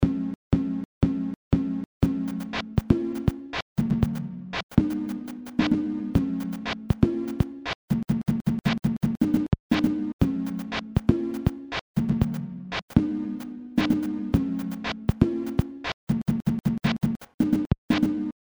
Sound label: Instrumental